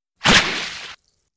Bullets Slice Water

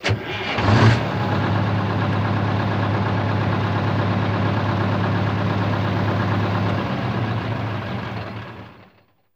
Truck Diesel Strt Off

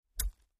FLESH CHOP, gore

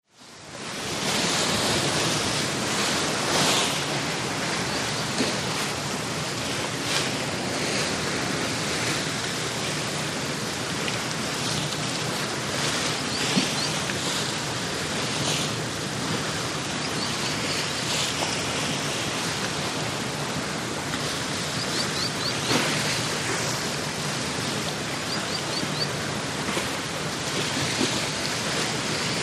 Ocean Liner Bow Wash S, ships

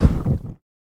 Blood Pump Heart; gore stabs & eat

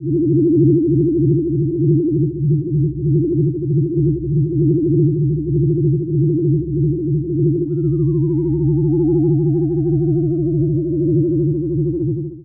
forcefield

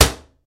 Cabinet Door Slam Cl

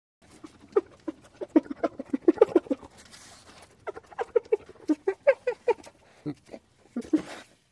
Hyena Spotted Laughi

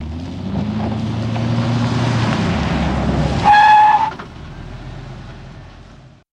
Cadillac Accelerate In and Stop, Skid Asphalt

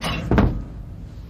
Lever Lrg Thud; levers

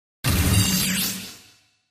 Space Hit Fluctuating Laser Fire with Metalli; Space Hit Fluctuating Laser Fire with Metallic Shimmer

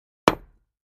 Patio Block Hit Sledg, concrete & brick

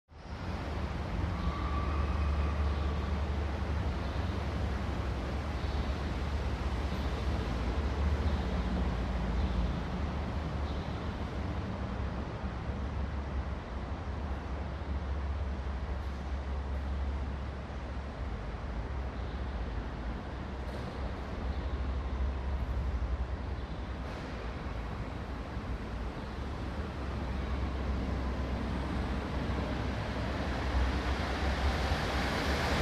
Parking Structure

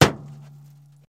Hammer Hit Auto Body; hammers

